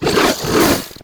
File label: imp attack melee, monsters; DoomRTX; imp5